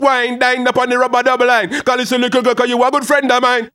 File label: RAGGA MUFF, B maj; Sound & Vision - Gigapack II